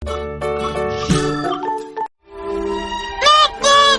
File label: noot noot cat